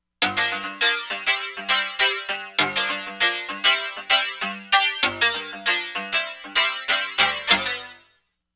Biwa Clav, Korg MSC-13S Ethnic 2

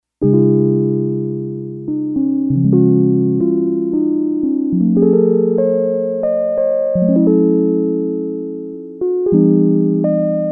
Electric Piano

